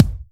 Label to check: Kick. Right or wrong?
Right